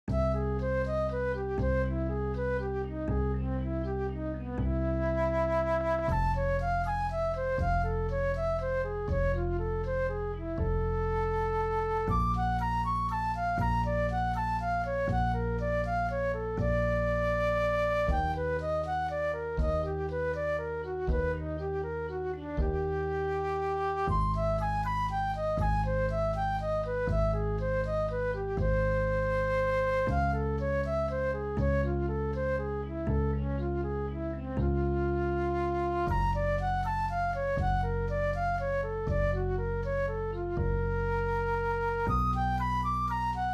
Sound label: Augmented scale study play along major 120